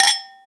Glass clink; Foley; SFX; 7rystan's 600+ FREE EDM SAMPLES & DRUMS

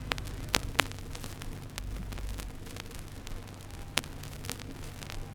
Vinyl; SFX; 7rystan's 700+ FREE COLOSSAL EDM SAMPLE PACK

